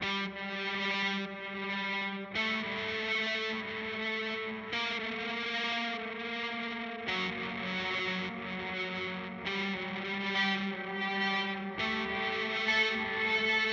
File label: R D reverse reverb; Walrus Audio Melee